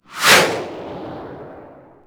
bazooka fire; WAR

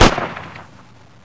30cal; WAR

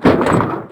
metal; WAR

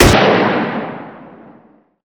kar98; WAR

